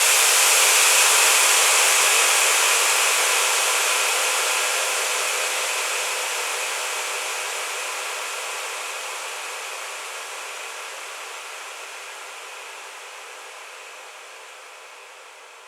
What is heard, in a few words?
White FX